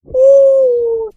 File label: woo